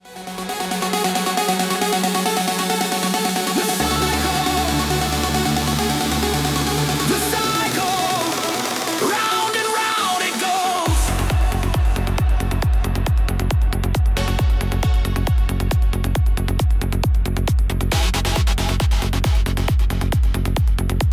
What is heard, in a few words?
hardstyle remix